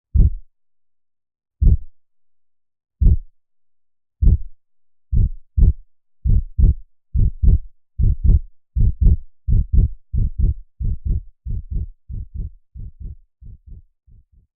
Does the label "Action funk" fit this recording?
No